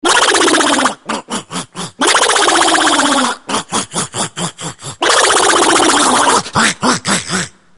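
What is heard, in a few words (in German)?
wütende Ente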